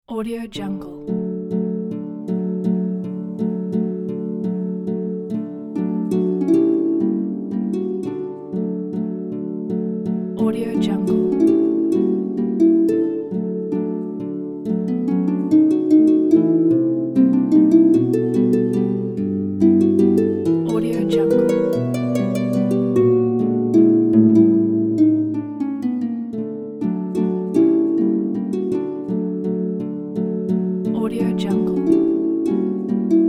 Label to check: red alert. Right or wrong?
Wrong